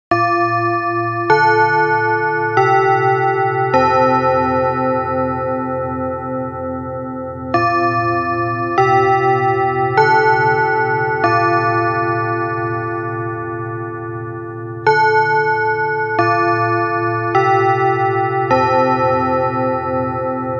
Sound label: chime; denon